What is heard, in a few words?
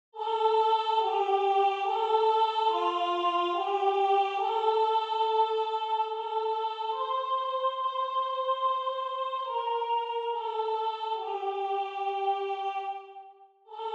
AVE MARIA Soprano; JACOB ARCADELT